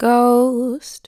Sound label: Ghost, Vocals, Dry